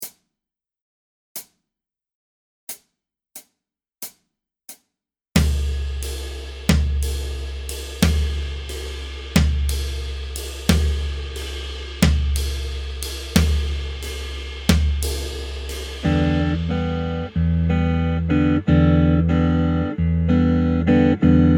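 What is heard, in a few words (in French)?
bossa complet syncopé, guitare jazz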